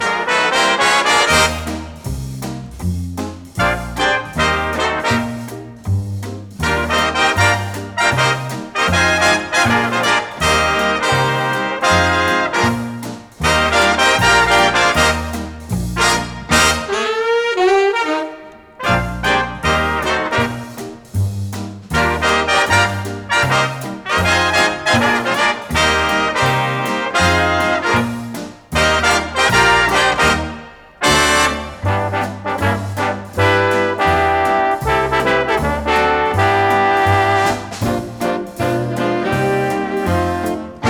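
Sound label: orchestrem, orchestra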